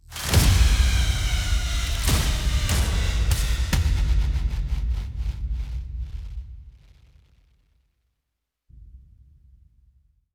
Music Cool Down; Toronto

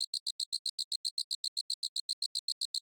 Env Bug Cicada Loop

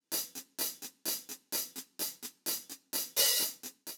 Hihat 8beats

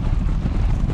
Spider Soldier Walk Loop

